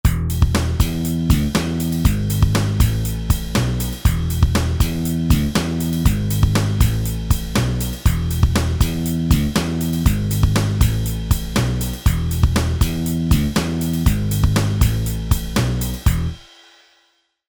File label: Rock